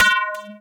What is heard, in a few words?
Percs, Elektron MachineDrum SPS1 MKII